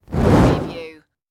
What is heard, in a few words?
Torch Movement Fireball Pass By